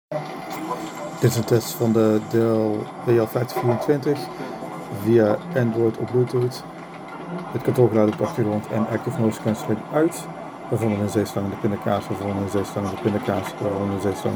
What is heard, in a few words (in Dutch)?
Android ANC uit Kantoorgeluiden